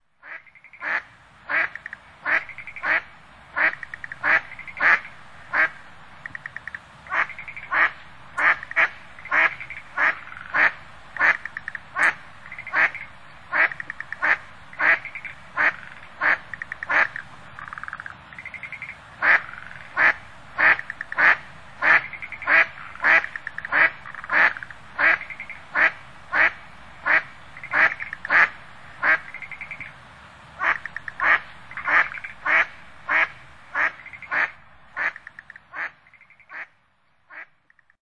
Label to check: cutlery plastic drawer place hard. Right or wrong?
Wrong